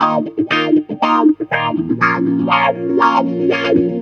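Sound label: guitar, Roots Disco; USB Soundscan vol.32; AKAI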